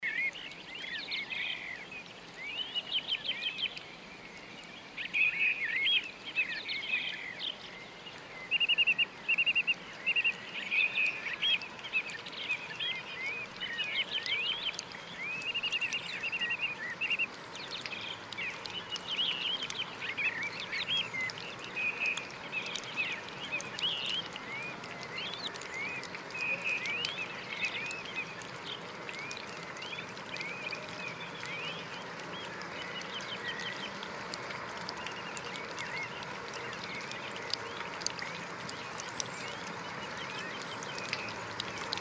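priroda i kapli vody oseniu, nature and water drops in autumn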